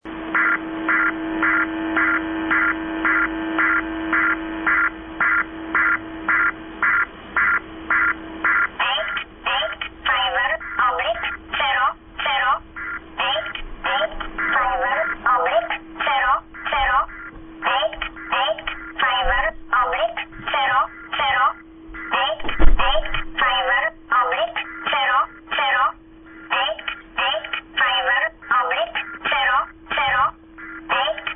fsk 16005 e11

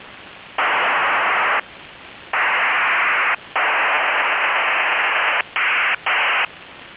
8542khz usb mil-std-188-110b-appc qam-64; mil-std-ppc

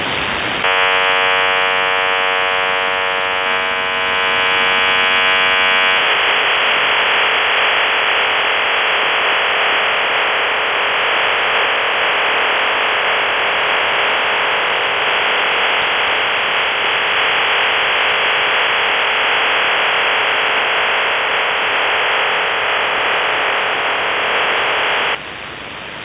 7954usb ofdm-64

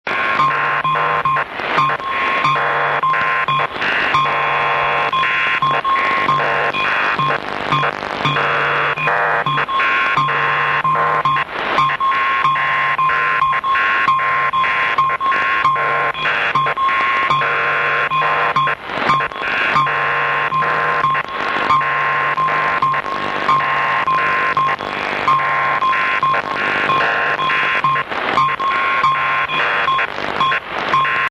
8338 USB signal

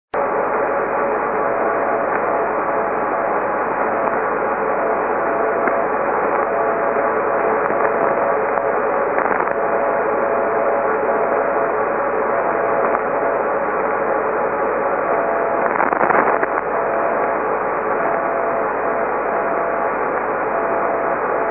CW; ok0emw

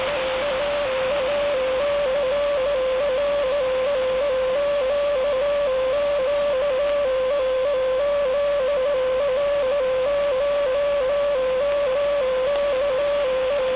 19210 khz usb

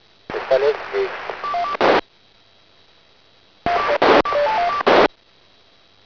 tone

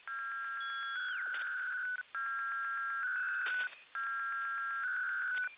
signal

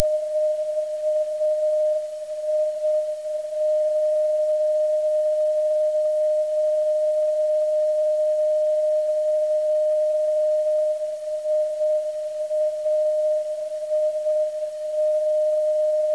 vox

